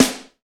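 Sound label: SD, snare drum; 90 s Sample CDs; Sound & Vision - Gigapack I CD 1 (Roland); Real-Kit m 1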